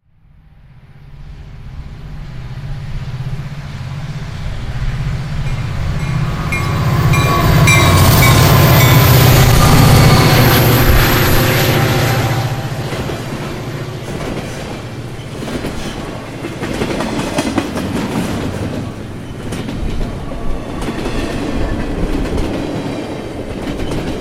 Train passing by

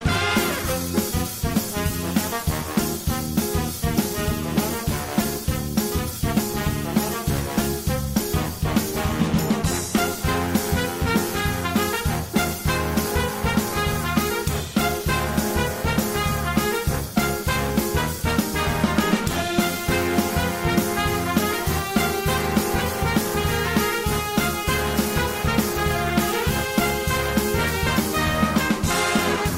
big band

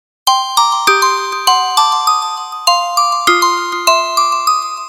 Message

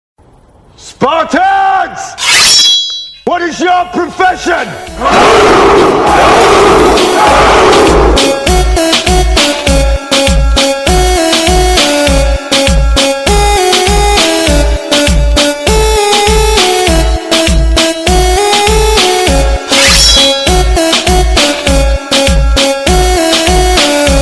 Sword; 300 Spartan

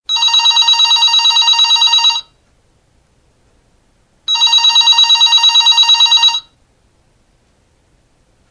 Telefono Office

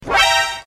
Msg Sharp

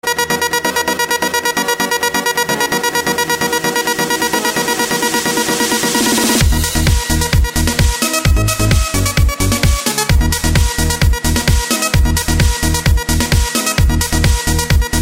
Electro Tone; D Electro Tone 1

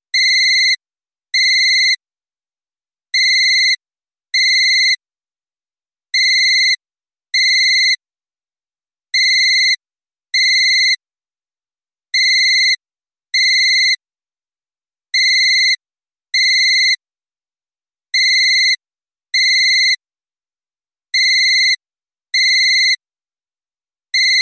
Low-tech phone

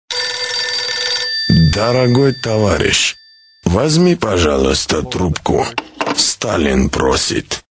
Ringing; Stalin

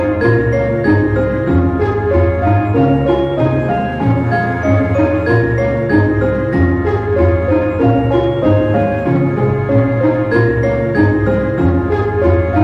ringtone

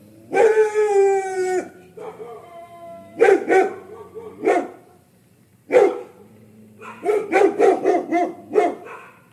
dogs barking

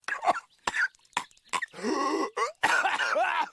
coughing

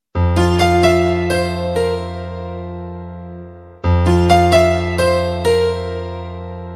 Telugu Music

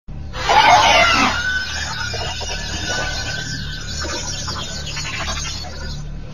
Hacking Signal

